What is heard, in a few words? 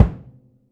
Prepared Drums